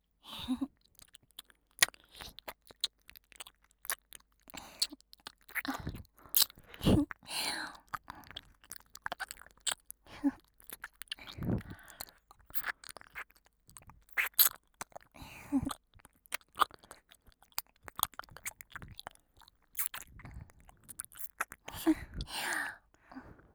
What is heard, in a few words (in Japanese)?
男の娘ダブル耳なめ,ASMR; 逆転!?男の娘スイッチ～お兄さんのことが好きになっちゃったかも～